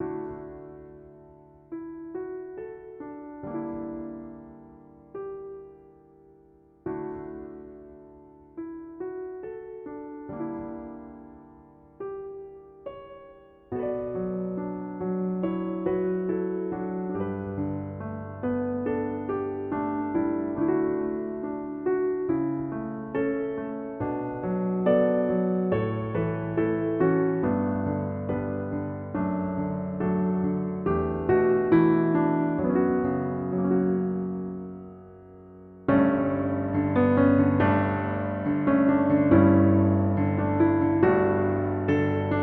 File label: Theme PIANO; edge of eternity; Selene Theme PIANO